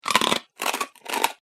animals dog eat bone short, Dog Eating Large Bone; Dog Eating / Crunching On Large Bone; Dogs; McKinney Sound

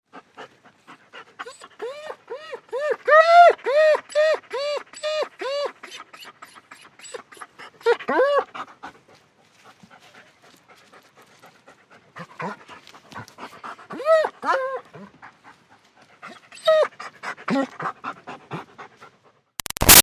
Dog German Shepherd Pants Whines Moves; Dogs; Animals; BigRoomSound

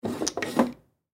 Foosball Table; Foosball Table Players Double Turn Back Forth Slow; Sports

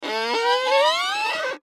Violin Ascend String; Cartoon

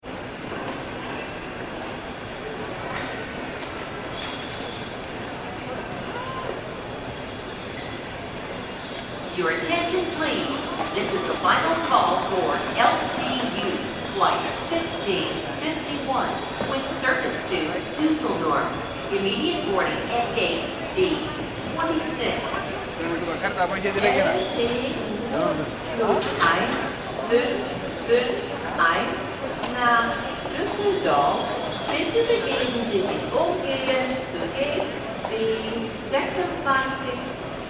ambience airport check in hall; Airport Ambience - Check - In Hall - John F . Kennedy ( Jfk ) Airport; Airports; Backgrounds; McKinney Sound

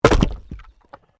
Wood Chopping Axe; Chopping Sound Effects; Objects

